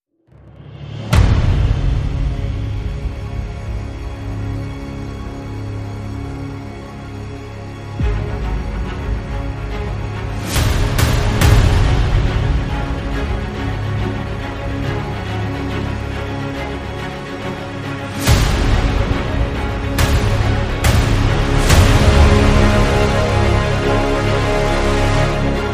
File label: No Keys